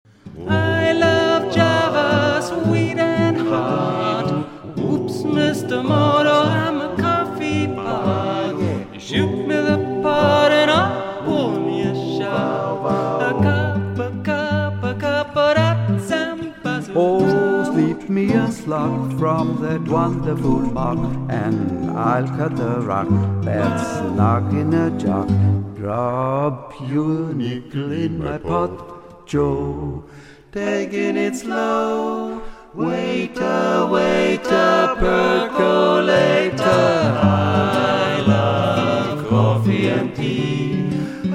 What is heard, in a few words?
Quartett; Paulaner Quartett